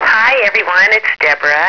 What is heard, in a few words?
Salute